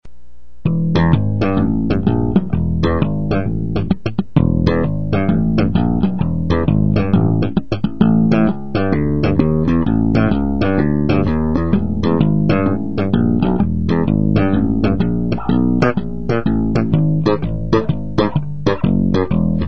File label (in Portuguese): slap, baixo